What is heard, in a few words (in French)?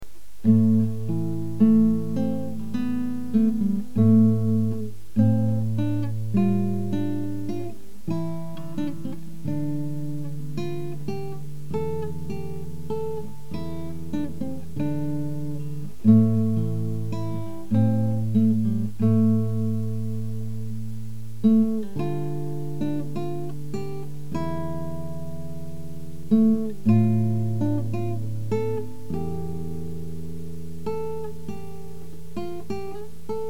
ballade